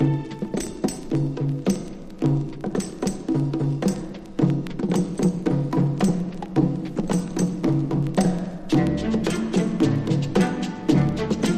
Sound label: JUNGLE DRUMS